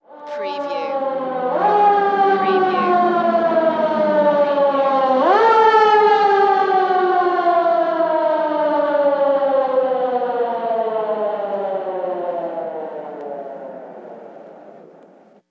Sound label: Police Siren Driveby City